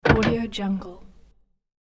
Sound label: door open movement knob turn; hollywoodedge